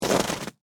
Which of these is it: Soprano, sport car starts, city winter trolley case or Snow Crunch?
Snow Crunch